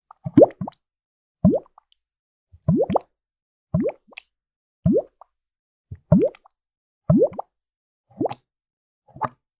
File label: blob bubble sound; free rider hd